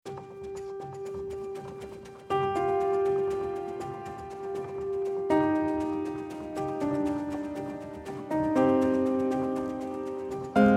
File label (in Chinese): 钢琴; Komplete